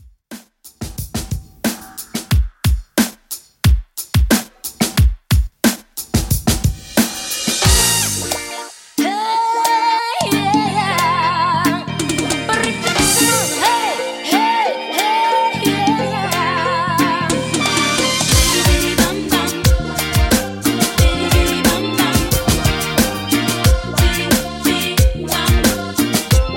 Reggae Redrum 90Bpm

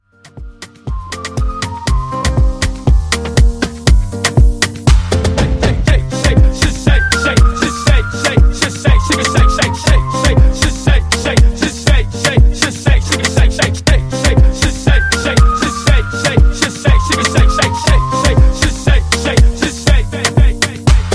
Party Intro 120 Bpm

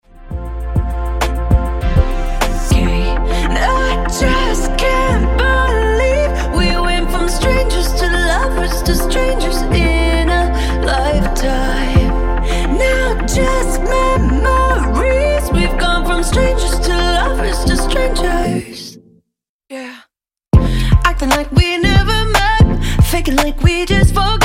Extended 100 116 Transition

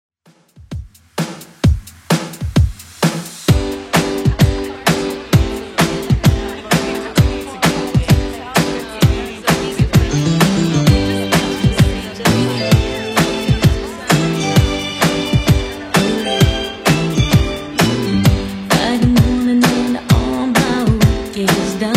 90s Redrum 130bpm

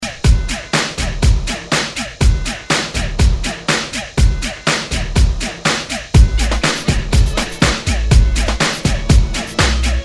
90s Re Drum 122 Bpm; DJDOLLS

